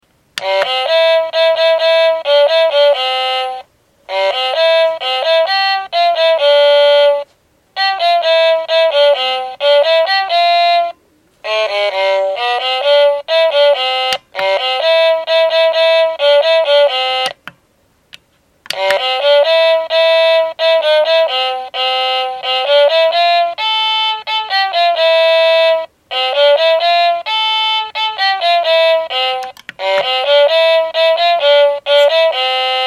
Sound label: VIOLIN